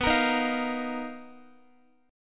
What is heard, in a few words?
B Major Sharp 11th, Guitar, 4F,4A,5C,5G,5C,6E; strummed